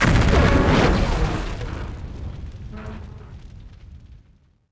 train Explo; impacts explos

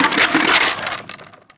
board bail, skater; Tony Hawk's Project 8; XBOX

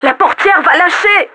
DR DOOR; Rally Fusion Race Of Champions; XBOX; FRENCH; DAMAGED

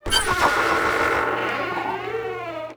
sign spin; portland

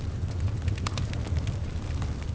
fireplace; Pirates Of The Caribbean

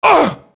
mobster get hit; Max Payne

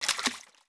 FS Water, footsteps

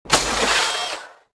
sentry shield open

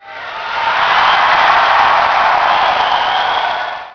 crowd cheer; Chase Hollywood Stunt Driver; XBOX

